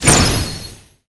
piercing guard

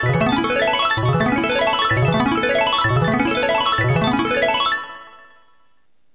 Cast Alarm; Seer; GamigoNA

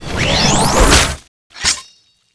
seidwarrior skill; Seer; GamigoNA

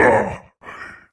Seid warrior die

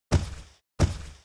Tiger Walk

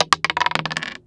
Dice Roll Single

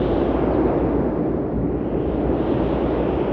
Door

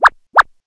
Mandragora Run; Seer; GamigoNA

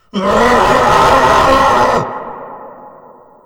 death; kazhul

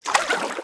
gondola run

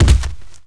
Run; Vehimoth; Seer; GamigoNA

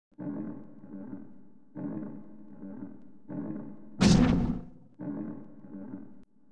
Robo Idle

